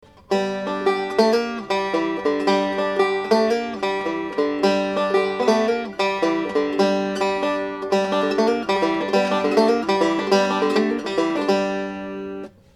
famous g lick slow, banjo

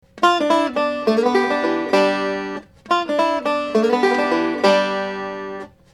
substitute for famous g lick, banjo

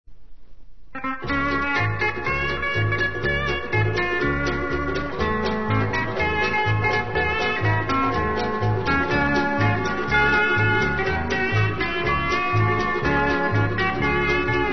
Hilo March

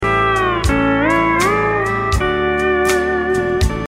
chop, e9th

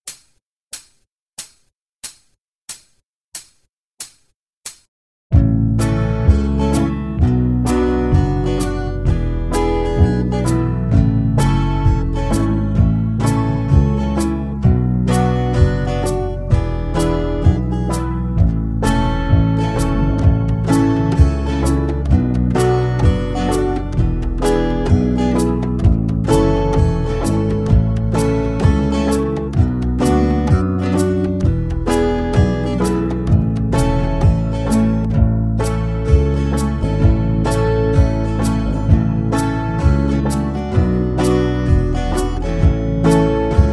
key of a slower, banjo backing tracks; dunphy's hornpipe

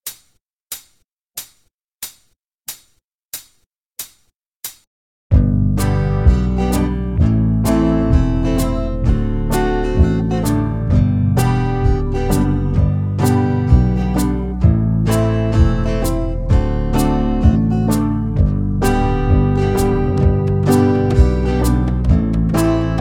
key of g slower, banjo backing tracks; dunphy's hornpipe

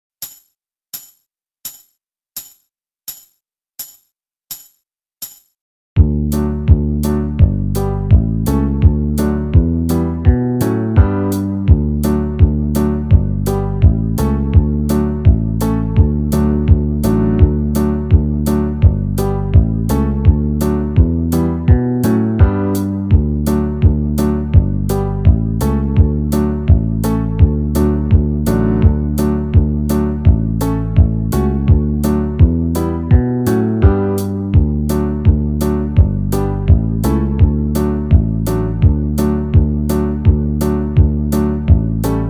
key of d normal, banjo; backing track; boys of bluehill

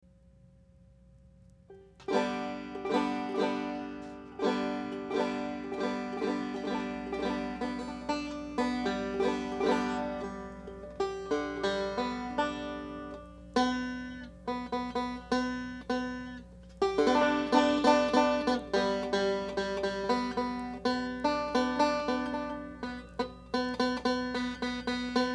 bad intonation, banjo

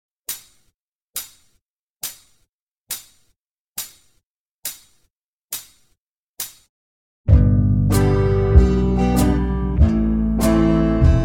key of g woodshed, backing track; dunphy's hornpipe

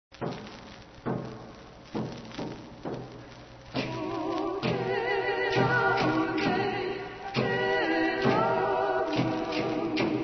chant; webley edwards; hawaii calls show

